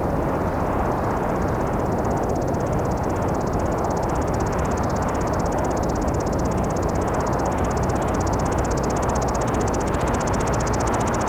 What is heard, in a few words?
desolate kopter; Sonic Foundry (Sony Creative Software); Ugly Remnants VOL-2; UR 2 FX (Long)